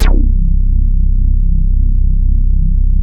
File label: JUNO 106; Masterbits; Vintage Voltage; SEQ-FX